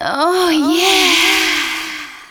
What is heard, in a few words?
female orgasm